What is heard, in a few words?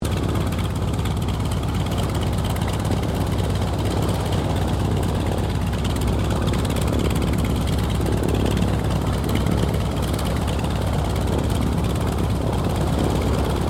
Mustang Kittyhawk idle, stereo; Mixed aircraft squadrons; Airplanes; Vehicles